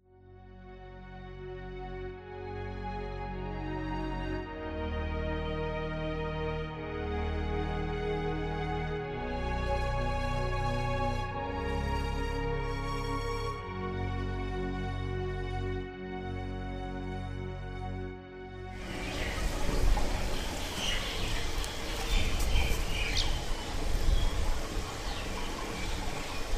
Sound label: soundscape lotus pond